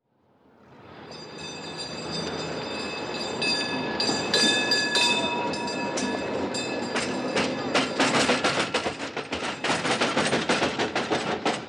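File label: old tram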